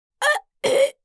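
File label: Adult female choking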